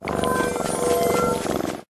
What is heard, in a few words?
Cat; Pet